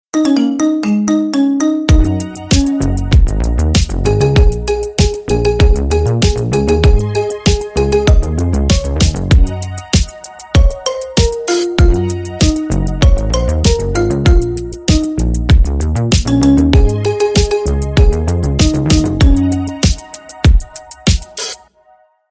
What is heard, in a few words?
marimba remix